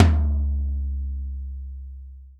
tom, Real Drum Kit, funk kit

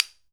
PAN CLAP, KOREAN DRUMS; AKAI S6000 CD-ROM; WorldSounds

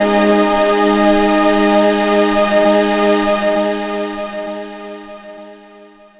Eb, Pads; Zero-G; Total Drum Bass

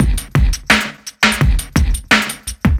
Orbish Funk, Synchro Funk, Beats

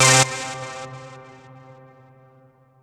Stab C3; 90 sSampleCDs; Club Techno

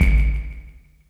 Bass Drum Horror; Lost Tapes From Mars

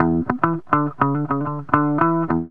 GTR; Zero-G; Funky Elements

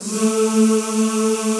ZZZ A2, Classical Choir; Best Service; Extended Classical Choir

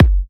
F# kicks; markus hakala kicks

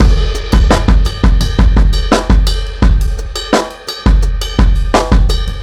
Half Groove 4 Bar, Breakbeats; Drum n Bass; Beats and Percussion; KJ Sawka; Drum n Bass & Beyond

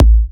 Kicks A; markus hakala

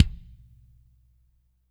CMP KIK, DRY KIT; Spectrasonics; Burning Grooves CD2; DRY KIT 4

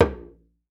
c#1, Talking Drums; Retro Sampler; EMU E-III

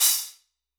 Soft Glitterbomb Long B, Hats; Airwindows

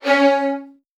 C#1, Strings, VN 16TH; ILIO; Kirk Hunter

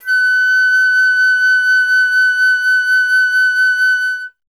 flute F#; Miroslav Vitous - Solo Instruments VOL-2; Solo lnst. II

